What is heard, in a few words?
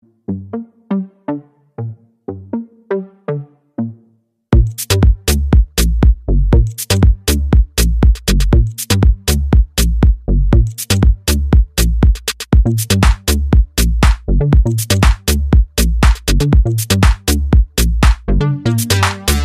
rumba